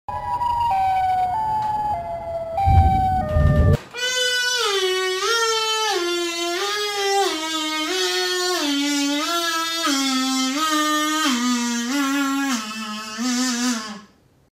Siren Head Sound on Kazoo

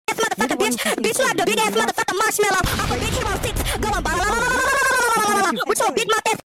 BLALALALAALLAALA

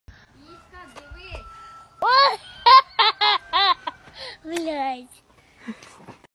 evil baby laugh

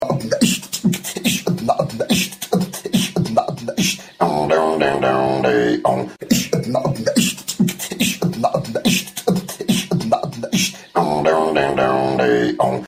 goofy ah beatbox; cartoon; made with Voicemod

